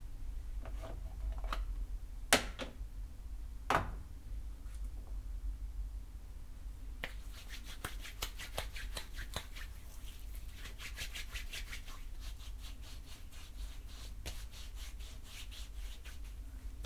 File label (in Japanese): ハンドクリームを塗る